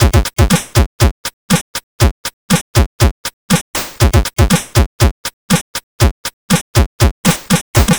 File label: C64 Beat, chiptune drums; Commodore 64